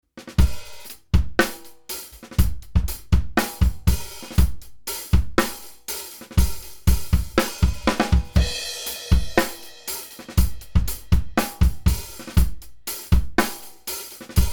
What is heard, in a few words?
Drum Bus, max. Reduktion ca. 6 7 dB; Chandler Limited RS124; Attack 2 Recovery 1 200 Ohm